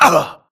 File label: grunt death neck; Titanfall 2